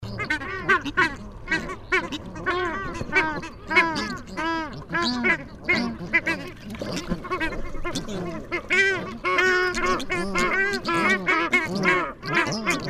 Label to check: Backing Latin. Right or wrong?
Wrong